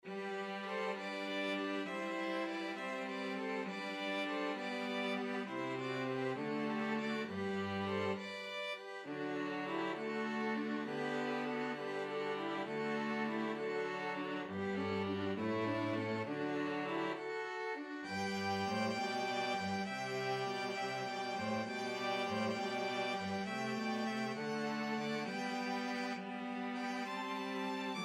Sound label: string quartet